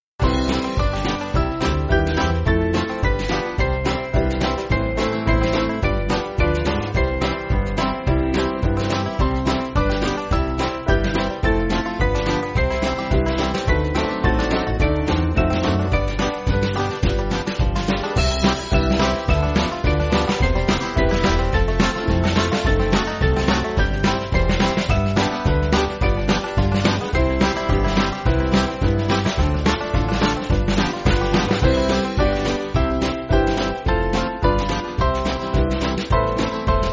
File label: Band, church music